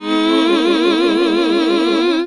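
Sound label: Gospel; Best Service Gigapack 1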